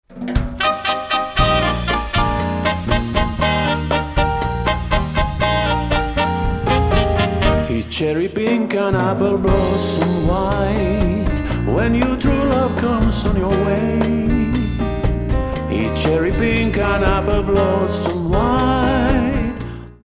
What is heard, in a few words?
Latin Dance